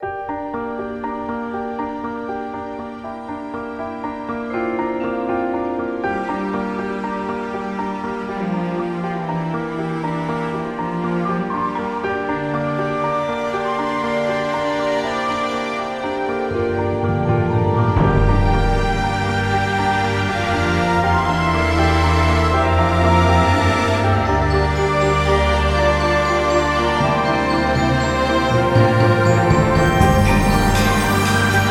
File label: cinematic curious ocean